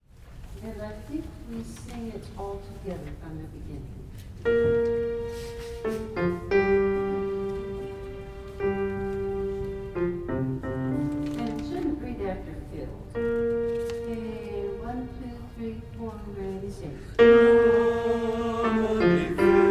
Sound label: bass and tenor